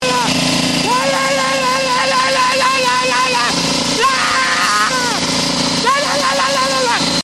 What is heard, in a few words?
lalalalala